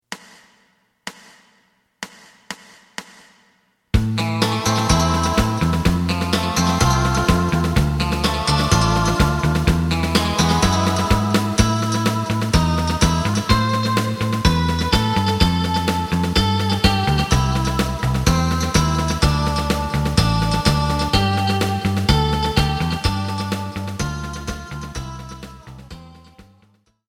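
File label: side guitar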